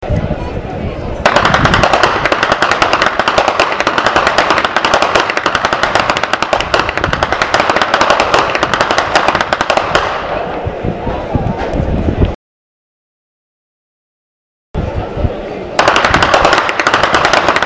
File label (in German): schnalzen; ainring